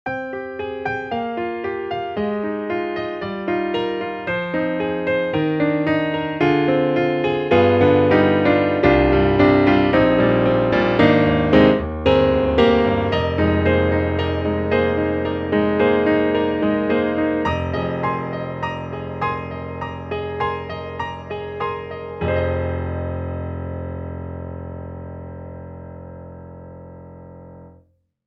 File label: Studio Grand, Mono Tube; XLN Audio; Addictive Keys